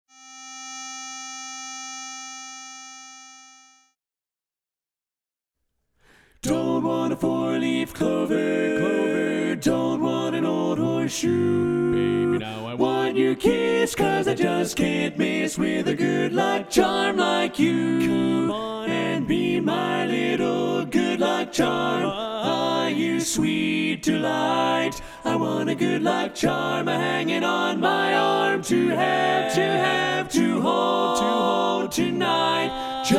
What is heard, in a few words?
tenor left